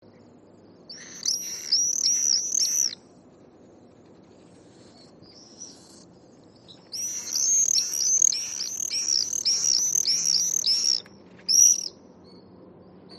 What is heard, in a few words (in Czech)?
rorys obecny